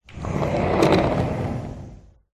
household door wood patio sliding internal open or close